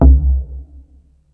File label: Tool Kit, Drum Machines